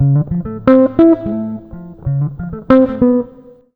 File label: jazz, acoustic guitar loops; USB Soundscan vol.04 - Electric & Acoustic Guitar Loops; AKAI